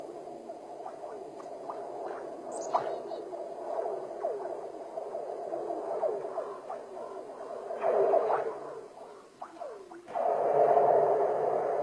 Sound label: ice sounds; three.js